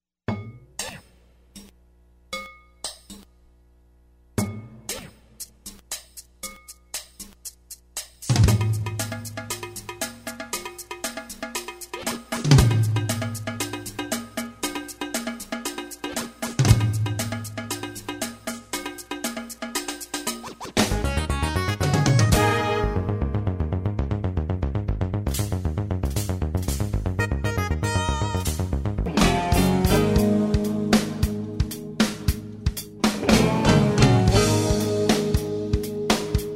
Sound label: Instrumental Jazz Rock